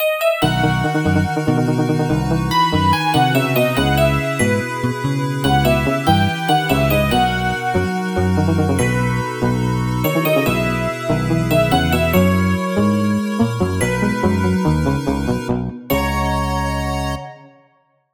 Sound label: Scene Clear